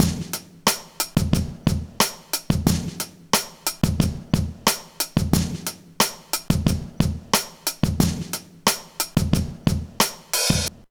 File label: Hopalong, Drum Loops, Vintage; Zero-G Smoke